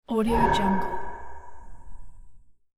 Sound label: horror airy braam